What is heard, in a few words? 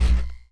energy, player steps; quake2